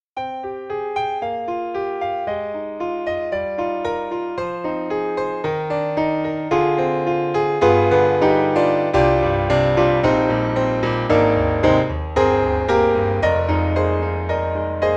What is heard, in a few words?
Mini Grand, Bright Rock Piano; AIR Music Technology